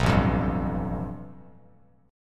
A 7th Suspended 2nd Sharp 5th A Bass Standard; A7sus2#5 strummed; JGuitar

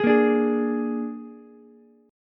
Ab Suspended 2nd Flat 5th Ab down Ukulele; strummed; JGuitar

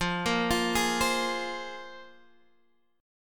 C 7th Suspended 4th Sharp 5th

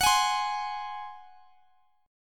F sharp Suspended 2nd Flat 5th; F#sus2b5 strummed; chord shape; x,x,x,23,21,20

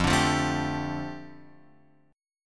F 6th Add 9th, Guitar Open F; strummed; chord; JGuitar

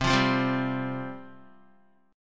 C Suspended 4th C, Guitar; Csus4 strummed; 7,8,5,5,7,x; JGuitar

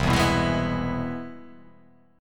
C Augmented Major 7th

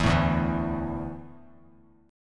D Suspended 2nd D down Bass Standard; strummed

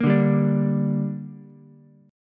D Suspended 4th Sharp 5th, Ukulele; 3,2,3,5; strummed

